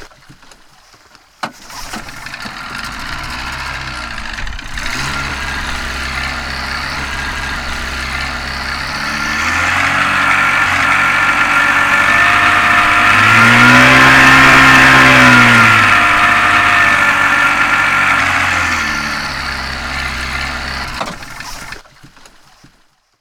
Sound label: boat onboard run stop; best service Blue Box; sea traffic